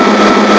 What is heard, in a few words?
grind; Amiga Soundtracker Sample Packs